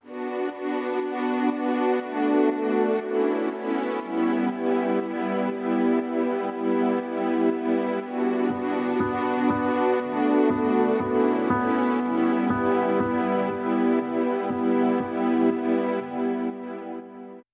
Amazing Life, No Drums

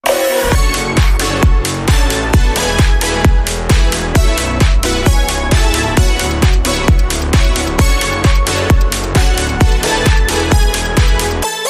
Crowd Control

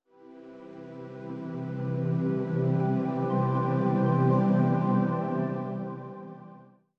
Link Sting

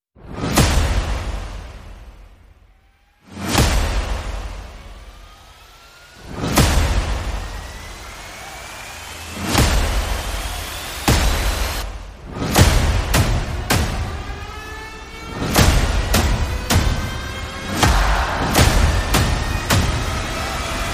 Percussion Vocals and Effects

